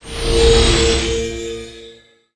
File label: Ring Shield; Seer; GamigoNA